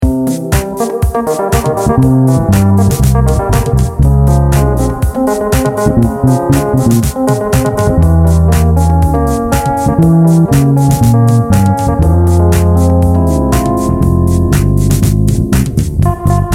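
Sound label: Phrygian Dominant groove, bass; a bassists guide to modes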